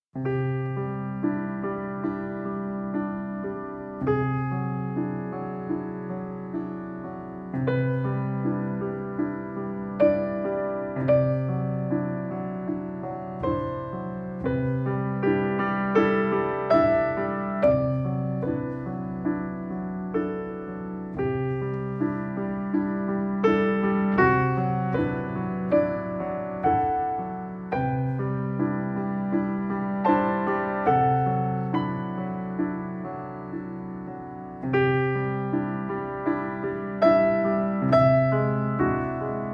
piano

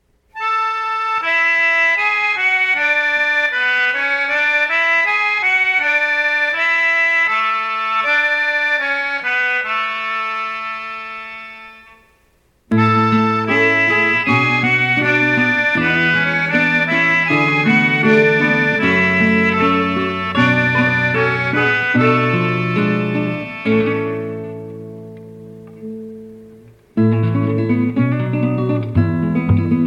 guitar

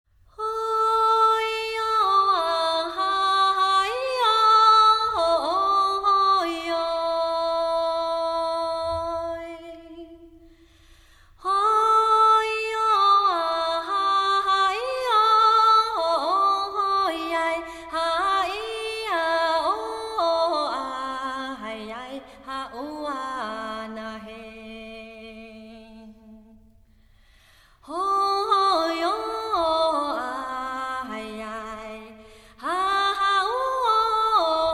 taiwan vocal

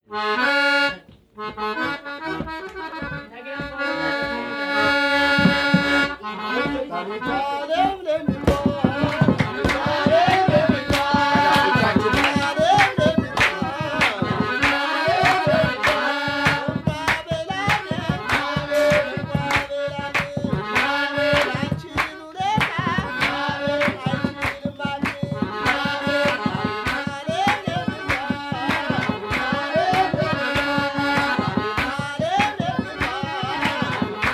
urban tribal; Ragnar Johnsonが1971年にエチオピアでフィールド録音した音源集

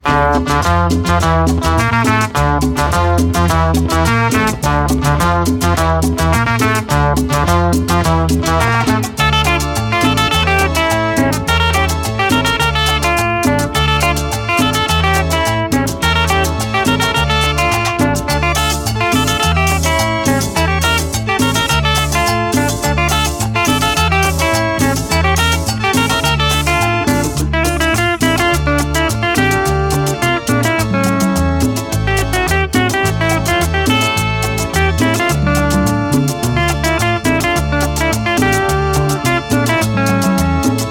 cumbias cumbias

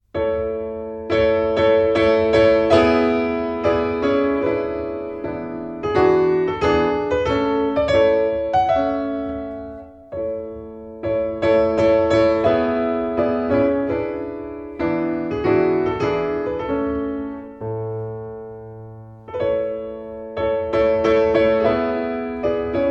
piano

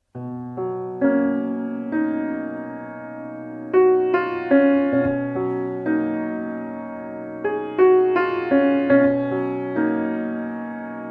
piano

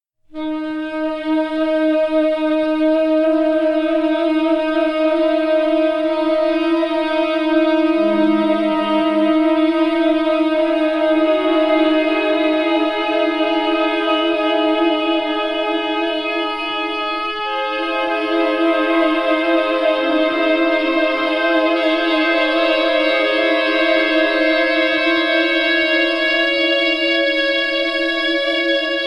sax; テープエコーを多用したジャズ～ドローン経由のミニマル・ミュージック

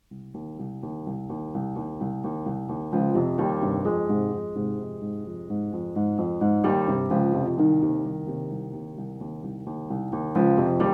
piano

